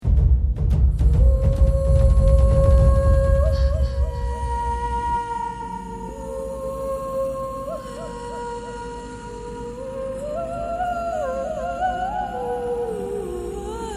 Dubstep Hit

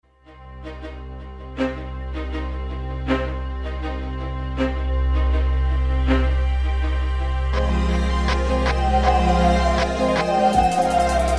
rain subliminal version

